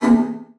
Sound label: Buttons Mechanical